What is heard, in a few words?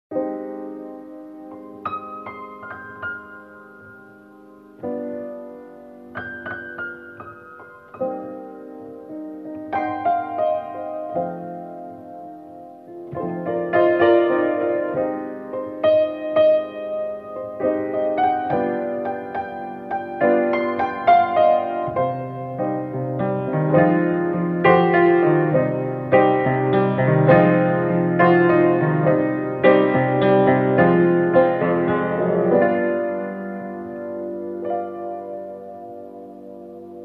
piano